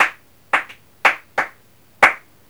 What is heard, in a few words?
claps